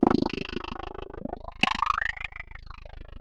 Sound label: Ring Mod, Cymbal, contact mic; Murf & Ring Mod